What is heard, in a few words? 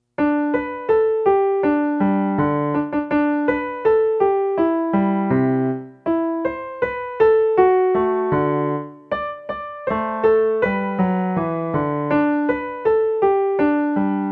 Jingle Bells